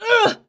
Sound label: female damage